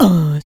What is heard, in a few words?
HIT, Jazz FX FEM PERC